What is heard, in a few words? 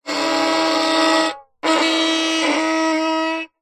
musical violin bowed scrape badly